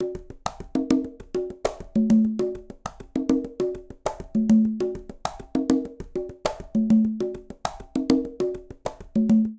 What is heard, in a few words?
Plena Quinto Conga Tumba 100bpm, Latin Percussion; Ableton; Factory Packs